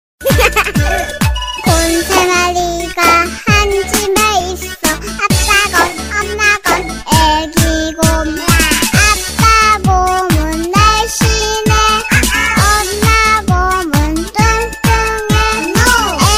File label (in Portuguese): toque de bebe cantando